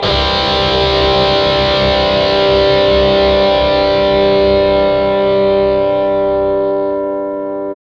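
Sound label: GUI MET2 C3, metal guitar; Sound & Vision; Gigapack I CD 2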